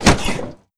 snowmobile suspension light

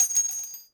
casing bounce hard

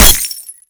bullet glass, weapons impact; Call of Duty Modern Warfare

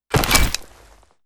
Battery Boost; Titanfall 2

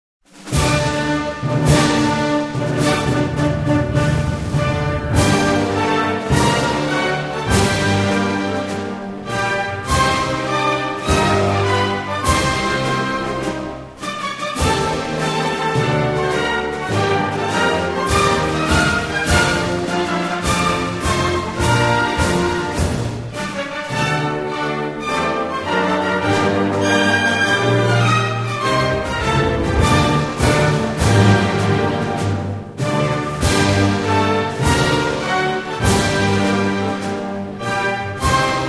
Music; Turkey; DCS

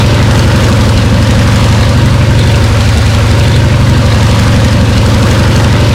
an2 rear, engines; War Thunder

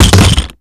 weapon container plastic; Call of Duty Modern Warfare; physics

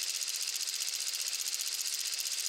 slide loop

